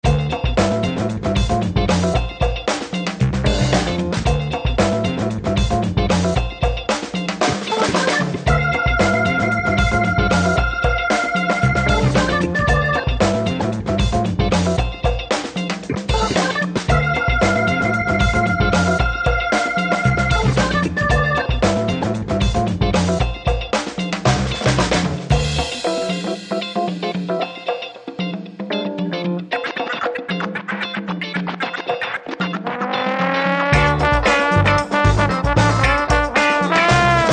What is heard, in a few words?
Percussive Muscle